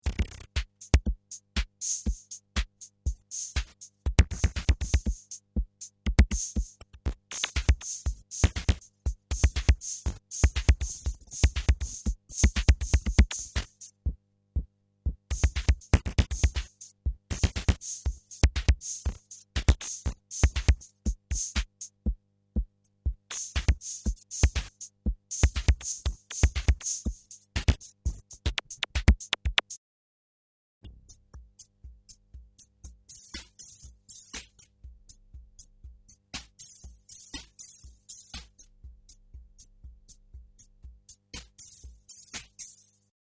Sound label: Kaossilator; Korg